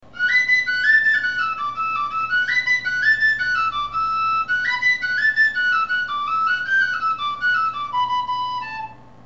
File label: Whistle offen